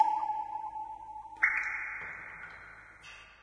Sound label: ambient water drips; source engine; portal2